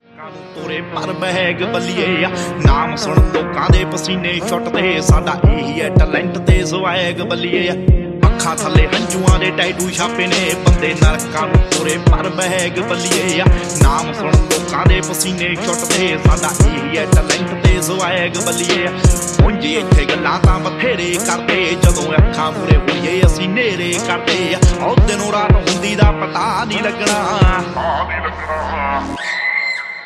Punjabi Song

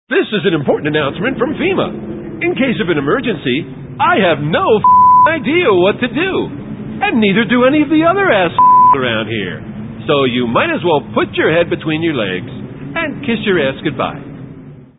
Bleep Ringtone

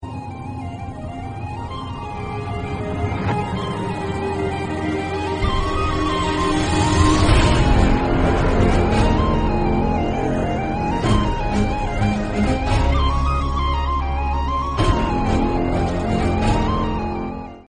Music Ringtones for Android

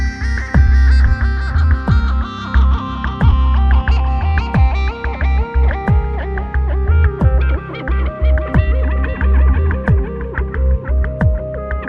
Huawei Nova 7i Tune Ringtone

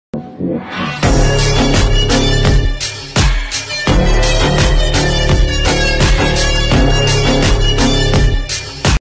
Attitude Ringtone

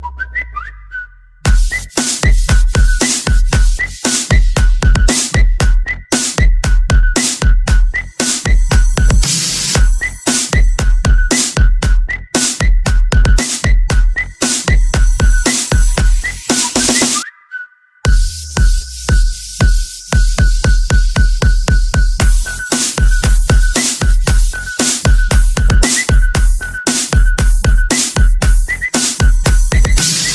Samsung Cool Ringtone Remix

